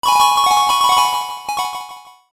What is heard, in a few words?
coin shower